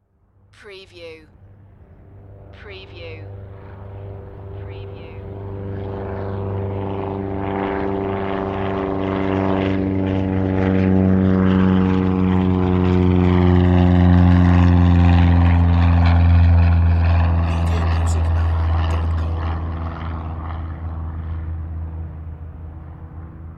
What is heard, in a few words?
Airplane Large Prop Plane Take Off